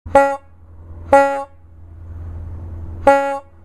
16inch covered bell squeeze horn

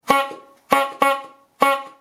covered bell, squeeze horns

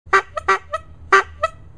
7inch trumpet squeeze horn

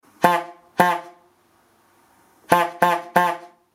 squeeze horns

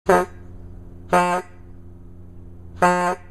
16inch nickel plated trumpet squeeze horn

